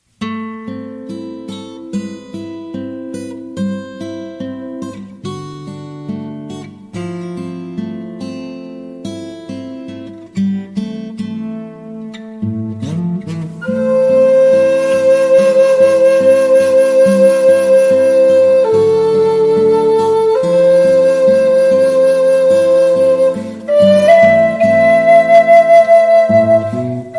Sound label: flute Native American Flute